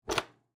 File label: Stall Door Latch, Stall, Door, Latch, Lock, Public, Bathroom; Office; Public Bathroom Sound Effects; SoundEffects-BlastWave